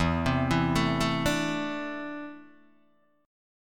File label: E 7th Suspended 4th Sharp 5th, Guitar, DADGAD